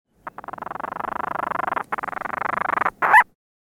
Toque de Telemovel; zonas costeiras; ilheu praia; painho monteiro

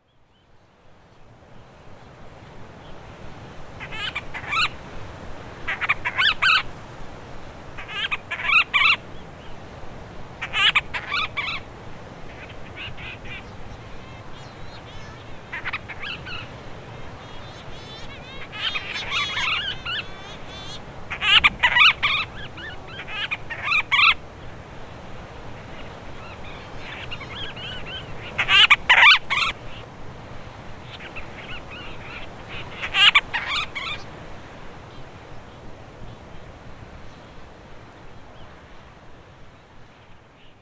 Cantos Voo, painho monteiro; aves marinhas

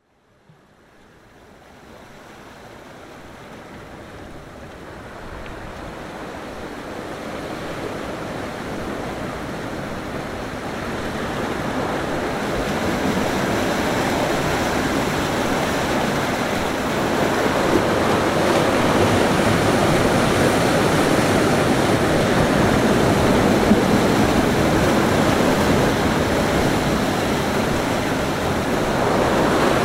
Mar Ilheus Formigas; vulcanismo; banco formigas

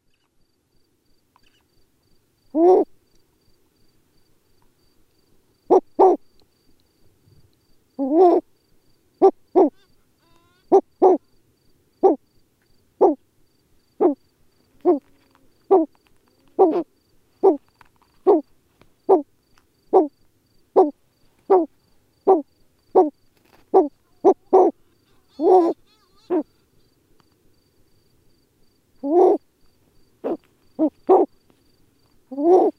Cantos Alma Negra; aves marinhas

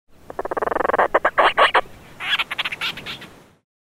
Painho Madeira; aves marinhas; Telemovel

